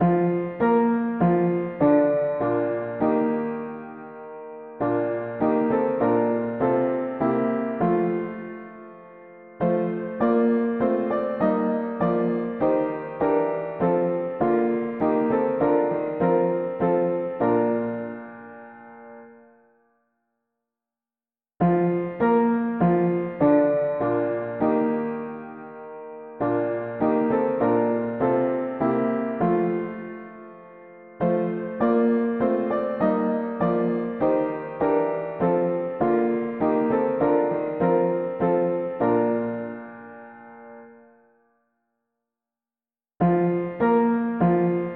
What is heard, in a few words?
mono piano; Revive Thy work O Lord; hymns